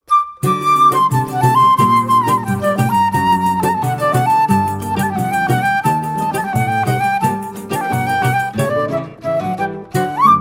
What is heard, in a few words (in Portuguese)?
flauta e sax